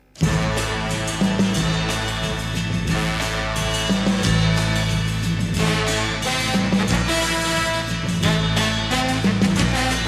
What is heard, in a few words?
ringtone